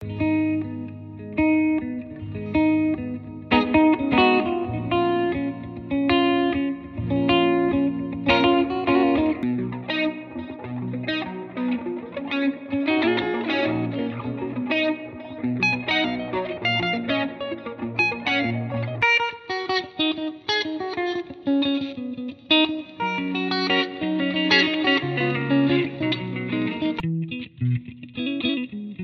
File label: AfroBeat Guitar HighLife